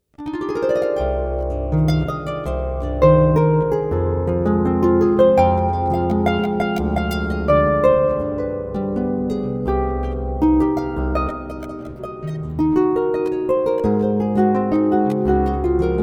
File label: Jazz Standard